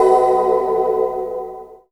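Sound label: choir hit; FILM TOOLS; Q Up Arts; Dream Experience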